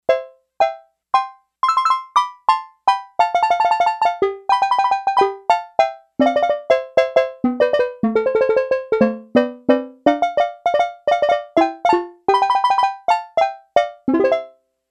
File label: Yamaha DX11 Steel Drum; Factory Preset Voices